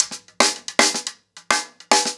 Crash 110BPM, ACOUSTIC DRUMS; Sonokinetic - The Roots Drumloops

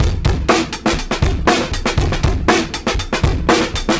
R&B Drums

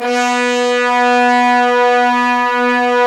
BRS LO BRS, Fat Pop Sect; Roland LCDP06; Fat Section

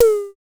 DSM2 Tom; MPC DSM-2

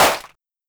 Simmons Clap

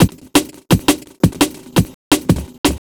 Beat, Futurist Drum & Bass; Drums & Percussion

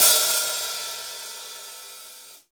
V-Pop Open Hi Hat, Roland BK-5

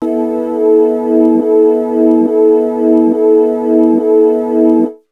Synth; Coldcut's Kleptomania VOL1

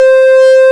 SYN Synt; Best Service Gigapack 1

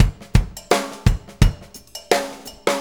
Jim, Drum Tools, Loops; Sonic Foundry (Sony Creative Software); Loops & Samples Drum Tools

